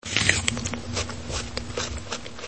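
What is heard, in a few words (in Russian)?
укус с жеванием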